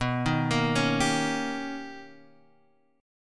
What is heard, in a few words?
B Augmented Major 9th B Guitar DADGAD